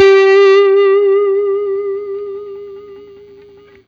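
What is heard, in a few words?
blues long vib, Guitar; 90 s Sample CDs; Quantum Leap - Guitar & Bass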